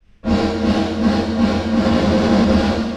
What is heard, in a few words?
LATE REVERB Size Large